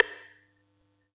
Yamaha EX-5

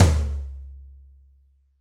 Room Tom 1, Roland BK-5 Toms

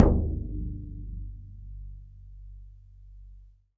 BODHRAN, Celtic Percussion

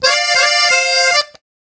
Accordion Lik F; Zero-G Kocktail Kollection; Accordion Lix

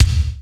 GATE BD, MOD. DRUMS; East West; Global Sounds

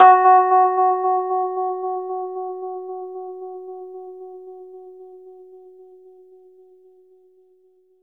Rhodes fast F#, 3D Keys; 3D Sound; 3D Keys VOL-1

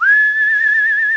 WHISTLE; CMI Sound Library Rev 1.3; WIND

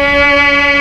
CELLO, MELLOTRON; East West - Global Sounds

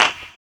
FLAM CLAP; AMG; Global Trance Mission VOL-1

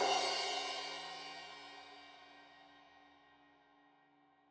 Crash 15in L edge, Cymbals Stereo

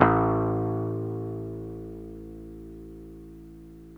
bass A; Zero-G; Chemical Beats; BASSES

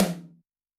Rock, Tom, Yamaha DTXpress-II

